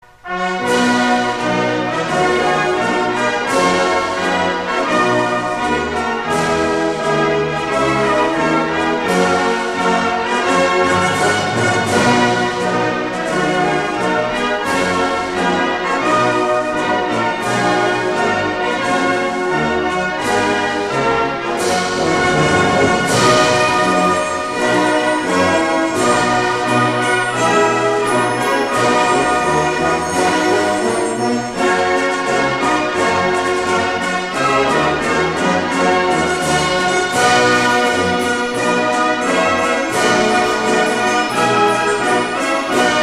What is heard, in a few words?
Anthem; Altean